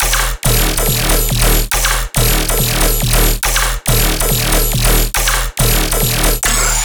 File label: Bass Loop D# 140BPM, Dubstep; Sailing Bass; Ghosthack - AC2024 - Day 13 - Dubstep Sounds 2025